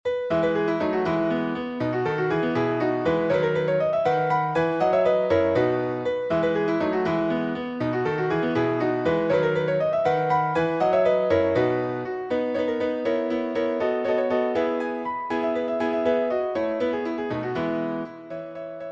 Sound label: English Dance